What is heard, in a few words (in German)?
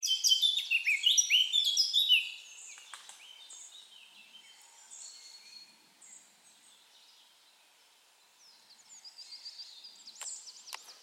Mönchsgrasmücke, Vogeltöne